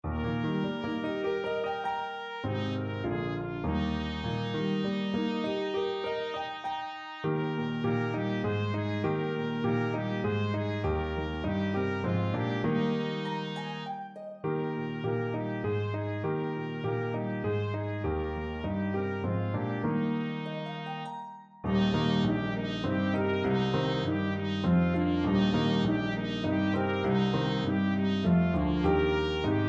Trio Bb Trumpet Piano Harp, Trio for Bb Trumpet, Piano & Harp; Come, Shepherds Rise!